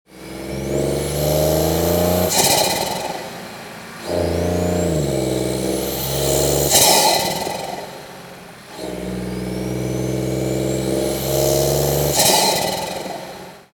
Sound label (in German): Fahrt, Open PEX Intake 1.0T/1.5T EA211 EVO; Ansaugung; apr